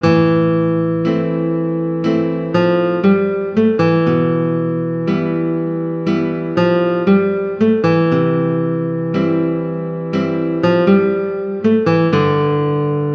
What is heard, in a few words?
synth